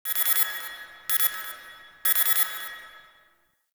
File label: Dojo Tech Beep; Warframe; Mod Quest; Teshin's Hideout